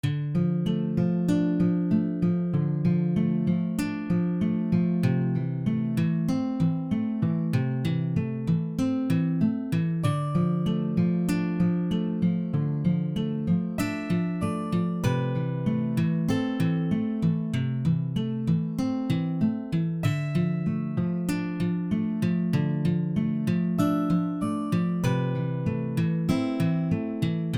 Guitar; Next Day Survival

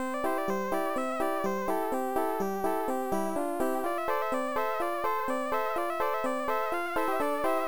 Chiptune; Jaguar XJ220; Amiga

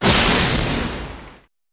Melt

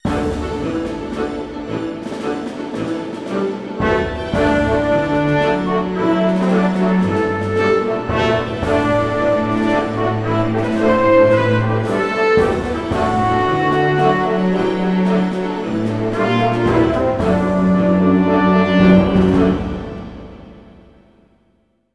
victory; Impossible Creatures

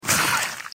Sound Monster Die; Day of Meat 2023

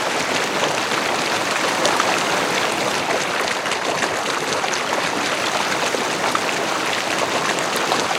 Car Move Water; gamerip; Car Simulator 2; android

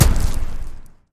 BOULDER HIT; Dino Shift 2

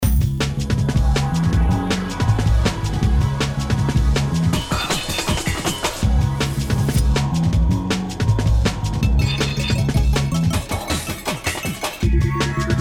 Ice Music

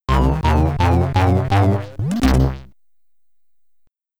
Game Over (SID Stereo); hermetic; commodore 64

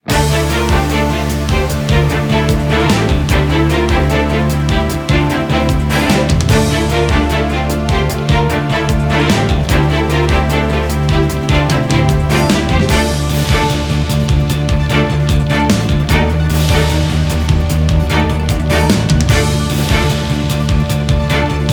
BGM DUEL PINCH; yu gi oh rush duel saikyo battle royale ikuzo go rush; BGM DUEL MOB PINCH

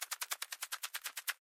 Propeller hat Loop; doodle jump 2; android; Propellerhat Sleepy Loop

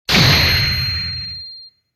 Coin In; Viper Phase 1

